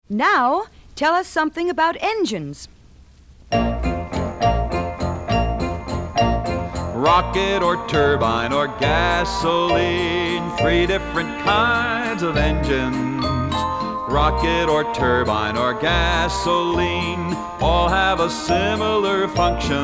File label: Engines (Mechanical Energy), Songs; science